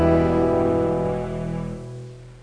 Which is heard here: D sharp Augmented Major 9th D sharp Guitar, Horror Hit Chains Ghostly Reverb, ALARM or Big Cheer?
ALARM